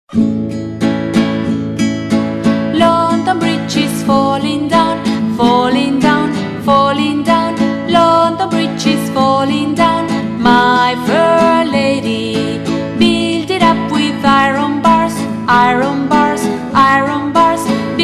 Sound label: London bridge